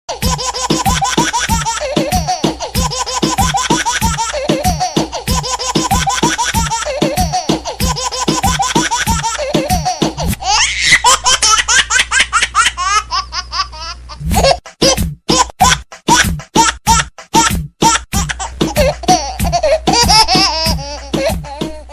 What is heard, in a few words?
baby laughing ringtone; tik tok; mobile phone